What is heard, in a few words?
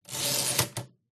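typewriter 1960 carriage return; Smith & Corona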